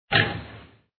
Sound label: hit, Basketball; Sports Games; BasketballPractice